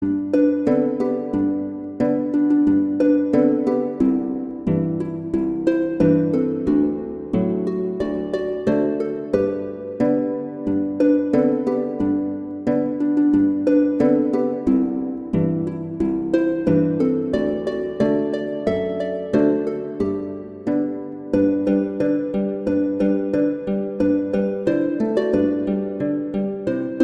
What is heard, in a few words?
Jingle Bells, Christmas